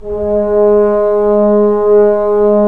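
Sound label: brass trombones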